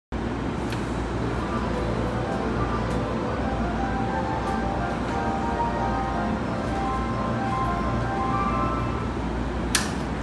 京浜東北線磯子北, train sounds

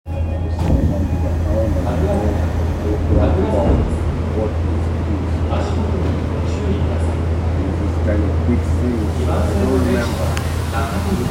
東西線神楽坂西

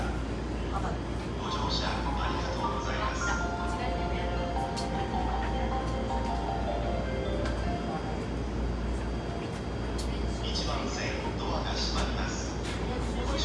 JR 湘南新宿線 東戸塚 北, train sounds

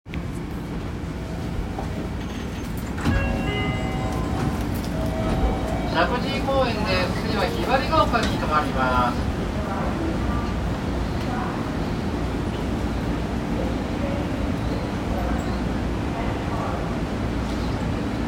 西武池袋線石神井公園西, train sounds

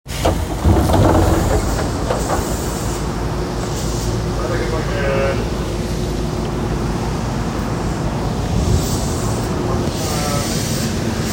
京急空港線天空橋東, train sounds

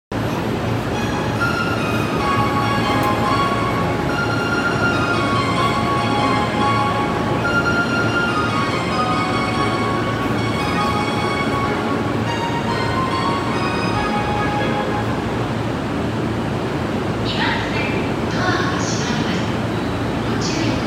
京浜東北線大船南, train sounds

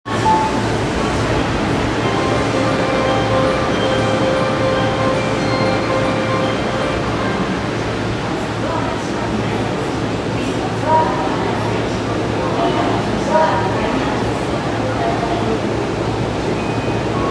千代田線代々木公園西, train sounds

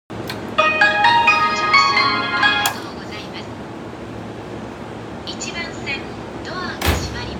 JR京葉線潮見東, train sounds

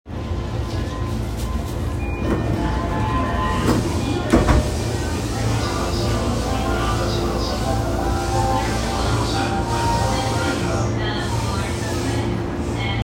京浜東北線御徒町北, train sounds

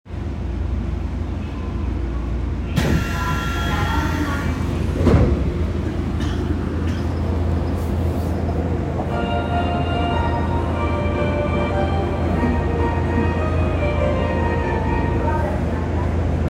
日比谷線茅場町南, train sounds

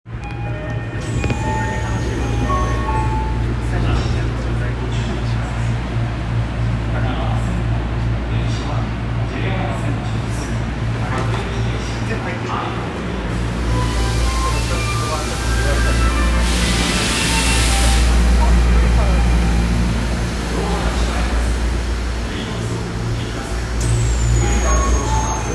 千代田線代々木公園東

